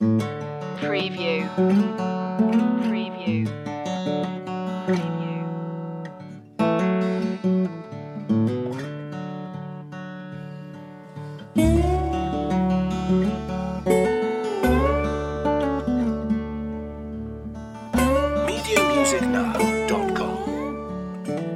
western loop

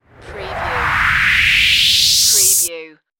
Rush Long

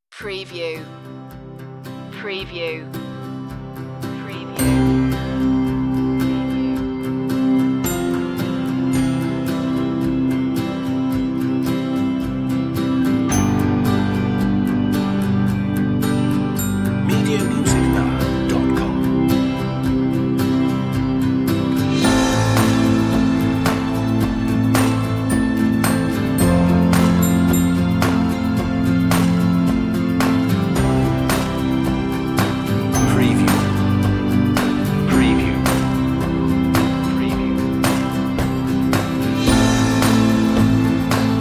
bell lead

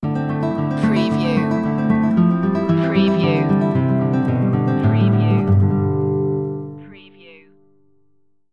scratches on the surface sting

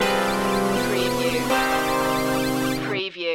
retro video game countdown to zero stinger

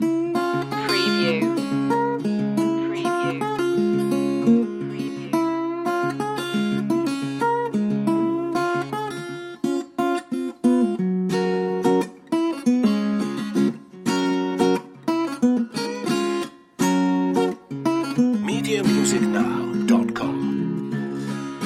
blues loop

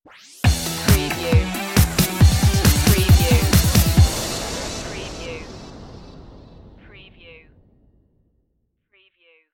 digital kutz stinger

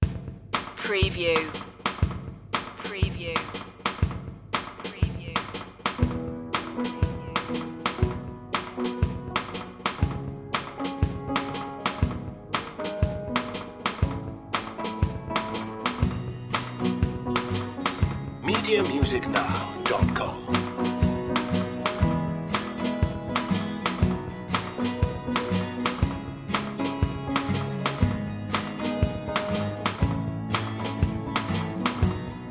no whistling

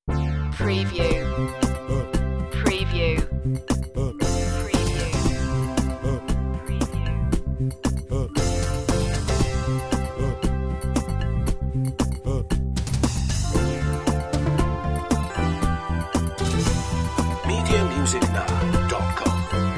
bugs infomercial

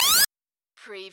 CARTOON LASER

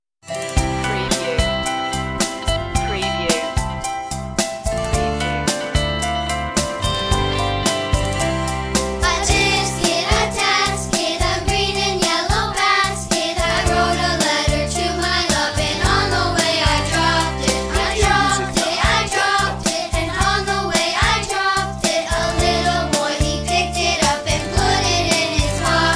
a tisket a tasket children group vocal

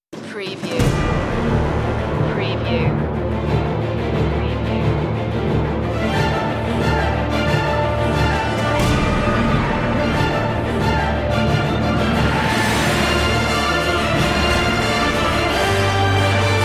no opening wind instr

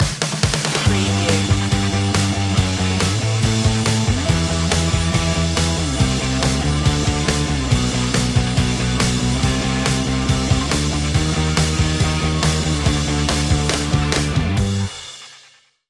intense rock

